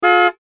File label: car horn